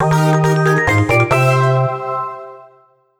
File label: Jingle Fanfare